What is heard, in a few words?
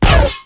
stinger